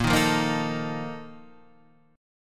A sharp Major 7th Suspended 4th

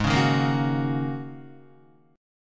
Ab 9th Suspended 4th Ab down Guitar; Ab9sus4 strummed; JGuitar

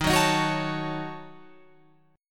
D sharp Minor 7th Flat 5th, Guitar, Open F; strummed

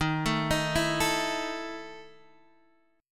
E Major 7th Suspended 4th D sharp

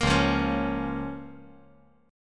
Db 6th; Db6 strummed; JGuitar

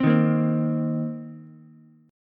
C Minor 7th C Ukulele; Cm7 strummed; JGuitar

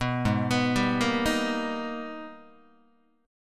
Ab Minor Major 9th Ab, Guitar; arpeggiated; 4A,4E,5A,5D,5G#,6C#; 2,4,2,5,2,2; JGuitar

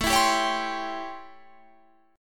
A 7th Sharp 9th A, Guitar Half Step Down

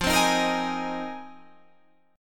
F Minor 11th, Fm11 Chord; strummed

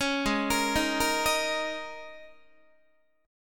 Ab Suspended 2nd Suspended 4th Ab down Guitar; arpeggiated; JGuitar

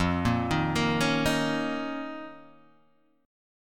F 7th Suspended 4th Sharp 5th F, Guitar, DADGAD

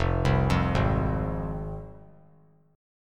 F Minor F down; Fm arpeggiated; JGuitar

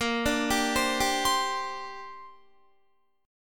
A sharp 6th Add 9th, Guitar; A#6add9; arpeggiated

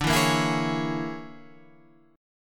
Bb 7th Sharp 9th Flat 5th D

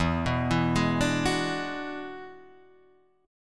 E 9th E, guitar; E9 Chord {0 2 2 1 3 2} arpeggiated

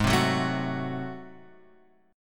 G sharp 7th Flat 9th G sharp, chord; G#7b9 Chord {4 3 4 2 X 2} strummed

